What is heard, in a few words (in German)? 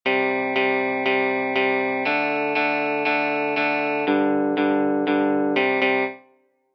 Power chords uebung